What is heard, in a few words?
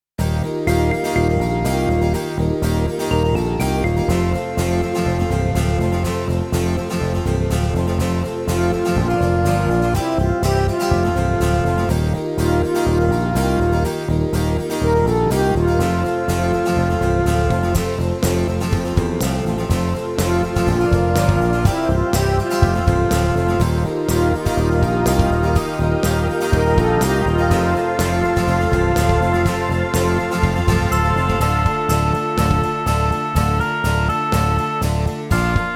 Give the Lord glory and power BACKING; short version